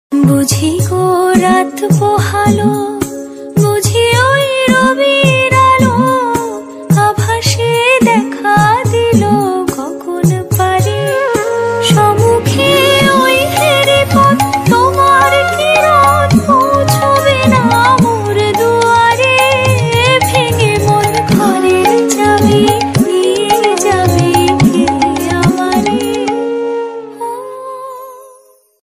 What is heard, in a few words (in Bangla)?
rabindra sangeet bengali ringtone bangla adhunik ringtone